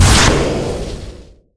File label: chain frost impact hero; weapons; dota; lich